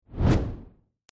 reveal

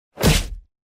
Smash

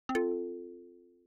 notification

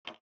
type; zara

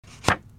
turn

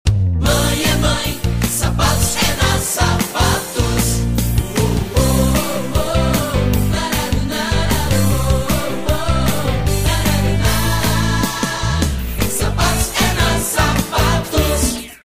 Jingles

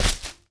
Grass step

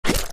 slime attack; mineworld

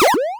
enemy shoot; sky knight

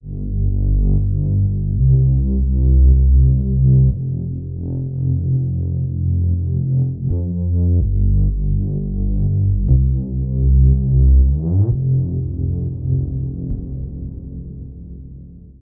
BASS

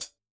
hihat pedal, jazz

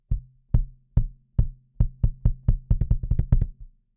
Gate kick bypass; SSL X Gate

